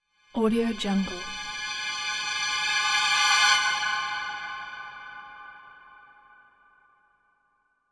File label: violin tension crescendo